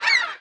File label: chimera napeo die